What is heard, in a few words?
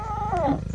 leopard default